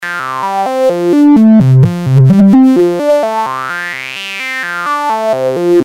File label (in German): Dioden-VCF, High-Pass, Modulationsquelle jeweils LFO; modular synth